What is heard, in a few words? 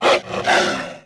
Wolf Die Vox; Civ4